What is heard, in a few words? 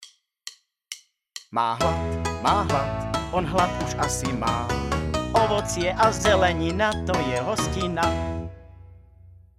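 Male Slovak sing mix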